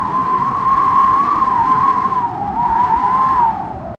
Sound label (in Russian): veter svist mejdu zdaniyami